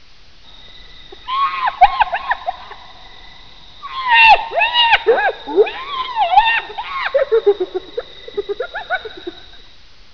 Hyena

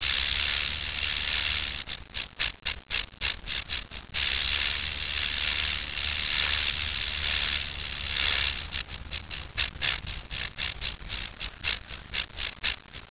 adder

